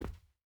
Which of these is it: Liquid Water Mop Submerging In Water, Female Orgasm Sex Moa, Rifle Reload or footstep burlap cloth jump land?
footstep burlap cloth jump land